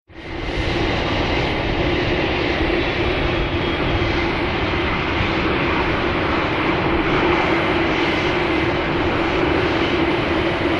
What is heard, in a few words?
Jet Warm Up Taxi Out, airport